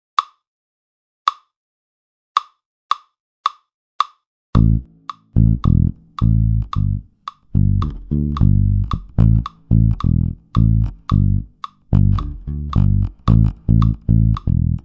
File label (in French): BASSE, Batterie Reggae; Buchmann; Batterie Reggae VIbrations